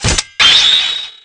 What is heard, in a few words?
sword